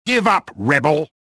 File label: anger